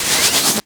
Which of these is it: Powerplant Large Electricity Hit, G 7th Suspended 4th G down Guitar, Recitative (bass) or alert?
Powerplant Large Electricity Hit